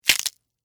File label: Crunch Celery; Texture; Crunches; Objects; BlastWave